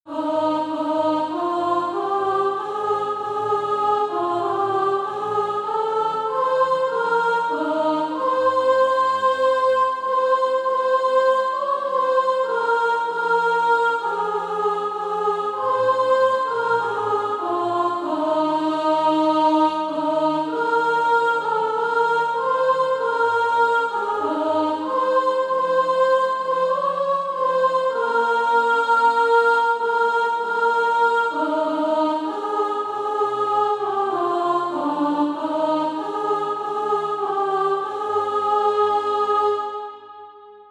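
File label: soprano; Eternal God Whose Power Upholds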